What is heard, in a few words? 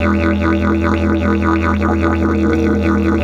E 150, DIDGE RIFFS; Big Fish Audio - Didgeridoo and Other Primitive Instruments